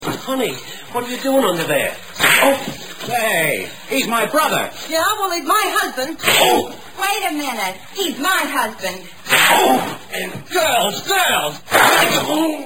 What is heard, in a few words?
slaps